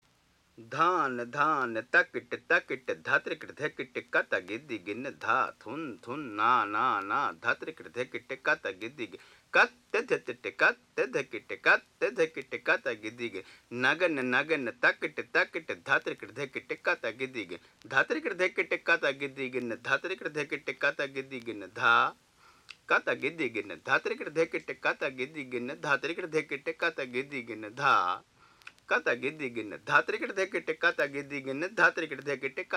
Dha n Dha n, tabla; Keramatullah

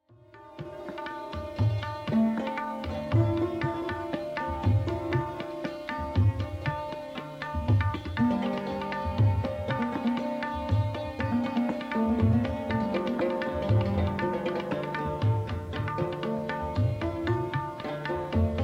Thumri Bhimpalasi Dadra Solo; OP Chaurasia

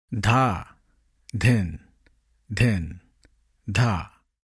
Dha Dhin Dhin Dha, tabla

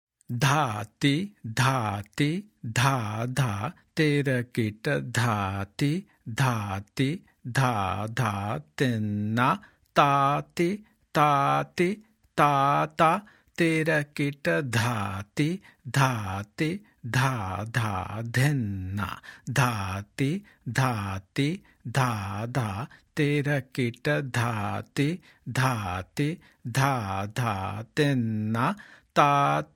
Slow, dilli kayda

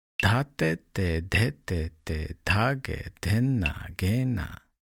Dhatete Dhetete Dhagedhin Nagina, tabla

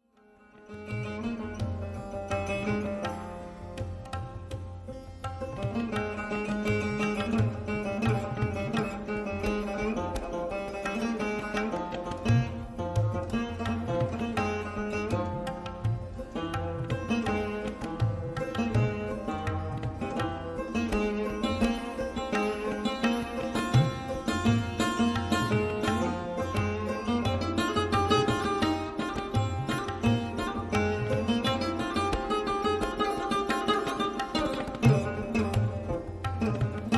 Misra Theka; Ali Akbar; Mahapurush; Chandranandan

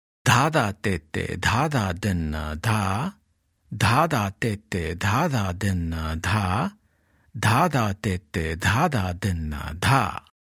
Tihai; Tintal; Benares Kayda 1

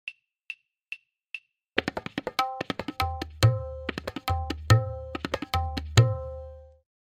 Simple CK Palla Click; Simple Chakradar

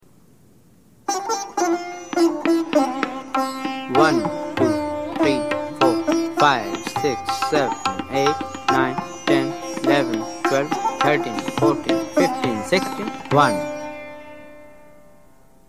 Tintal Cycle; Demo by Ravi Shankar and Chaturlal

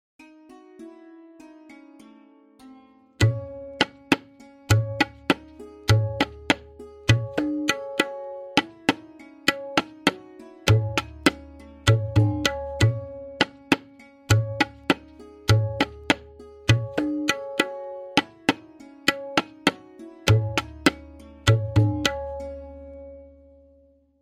Variation 12-Played with Lehra, tabla; Tintal; Benares Kayda 1

